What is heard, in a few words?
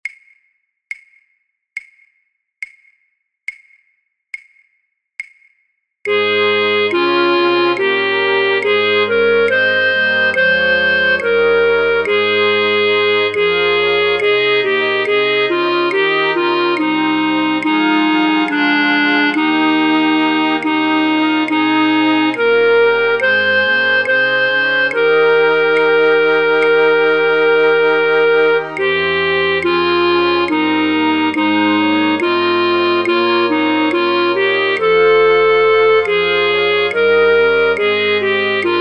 flute choir, Fluit 3A1, Fluit 3A2, Fluit 3B1; voor oefentracks; Matthaus